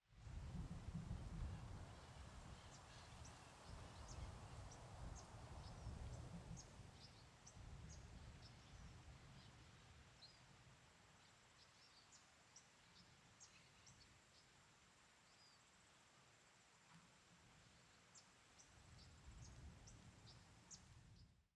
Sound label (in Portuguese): Grupo de Maritacas Sobrevoando em Area de Muito Vento; aves